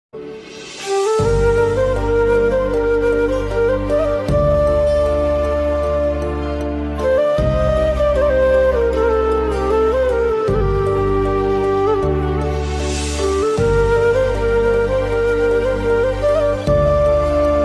flute song ringtone; dhadak movie

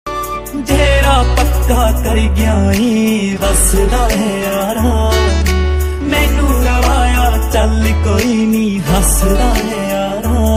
ringtone

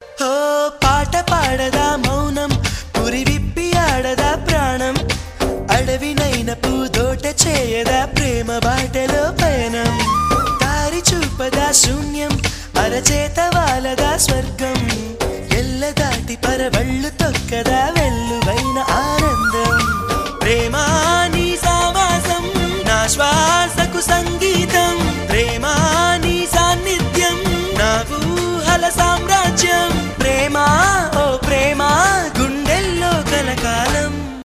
Telugu Ringtone

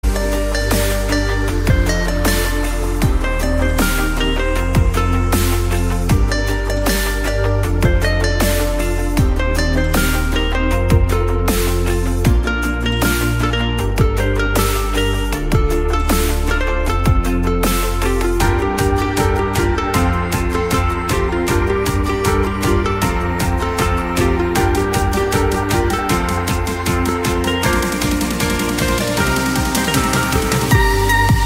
instrumental

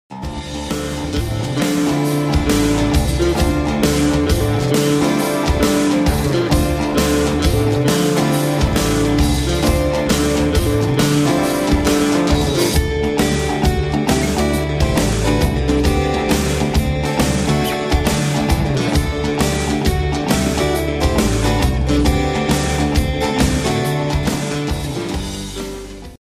dancing ann guitar ringtone

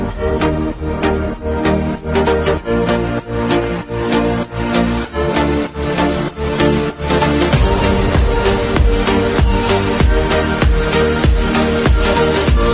instrumental ringtone

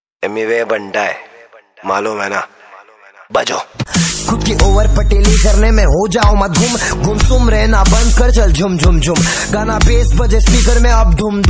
ringtone

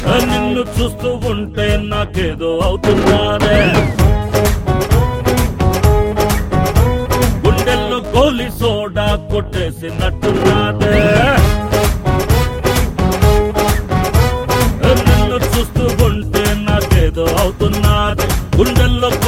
Telugu Ringtone

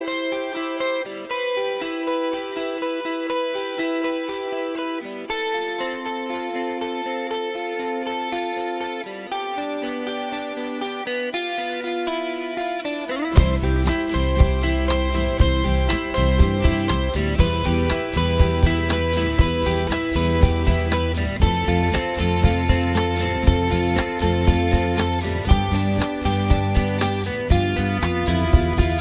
guitar ringtones

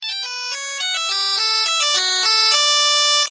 love actually tone

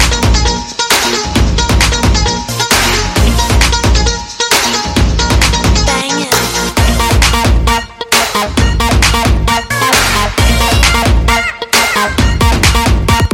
instrumental ringtone; jawaani jaaneman movie

